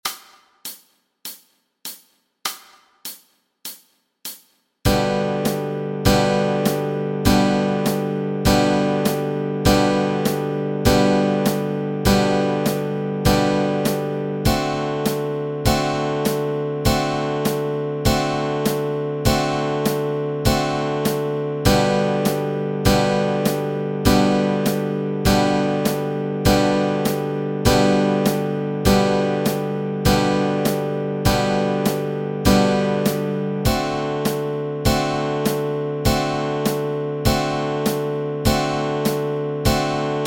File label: Rain Rain Go Away chords